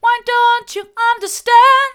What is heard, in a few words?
why don't you understand hard, vocal